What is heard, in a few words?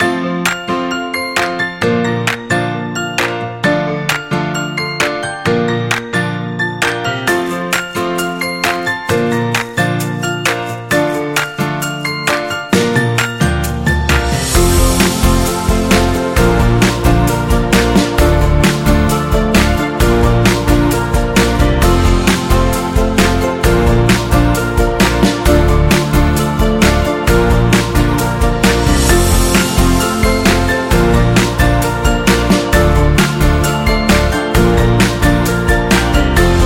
Happy Folk